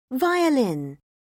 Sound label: violin; Oxford Word Skills Intermediate